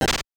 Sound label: take damage; ducktales